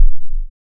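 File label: waveform FM sine sine envelope silence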